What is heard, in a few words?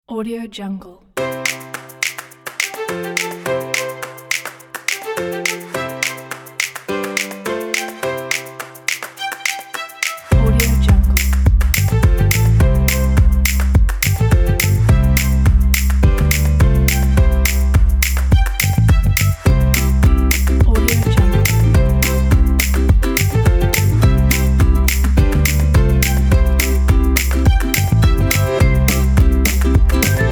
upbeat acoustic summer fun